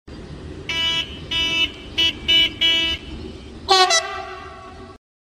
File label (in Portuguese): Buzina; locs elec; Comboios